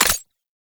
UI Mod Rank Remove; Mods

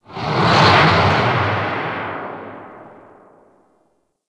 Fortress Asteroid Pass; Warframe; Ambience; Grineer Fortress

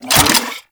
Torpedo Pistol Reload; Warframe; Grineer

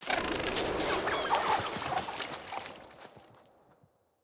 Bunnies Run; Dendria

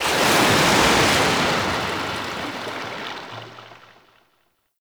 Ocean Wave Crash Large; Warframe; Grineer Ocean

